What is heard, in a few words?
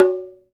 Bongo Low; Sonic Foundry (Sony Creative Software); Joe Vitale Latin Percussion